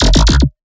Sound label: Dirty Bass 150 Ringtone; One-Shots; Bass Hits (Dirty); Ghosthack; Color Bass Essentials